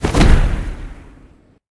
Arcane Activate; smite; merlin

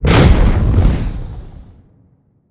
spin whoosh; smite; chaac

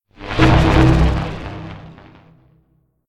projectile; smite; raijin

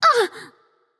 grunt hit light; smite; voicepack; hel

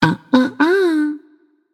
nv disagree; smite; voicepack; morgan le fay

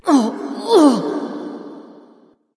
grunt lift light; smite; voicepack; hel

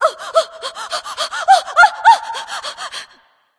fear; smite; serqet

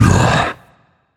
ugh; fenrir

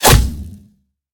bow hit; smite; god artemis

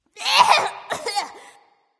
clear throat; smite; scylla

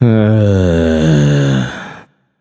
sigh, voicepack; smite; jorm

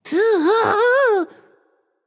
ugh, voicepack; smite; jorm

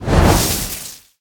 dark fire; smite; hel

